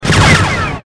proton fire; lfs; naboo n1 starfighter

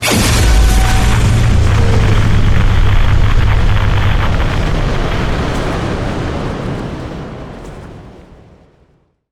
em pulse; killstreak misc

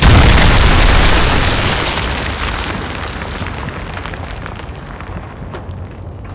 missile explosion; killstreak rewards; predator

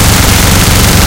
wing loop, spitfire weapons; lfs

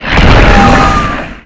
brake; lfs; arc170

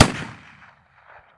turret shoot short far

